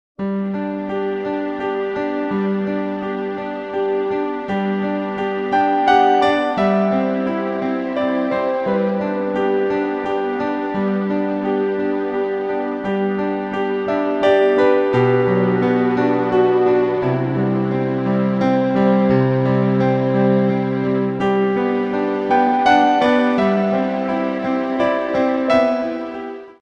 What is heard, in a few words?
waltz